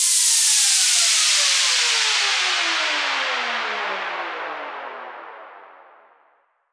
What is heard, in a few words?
White Noise Down; Cymatics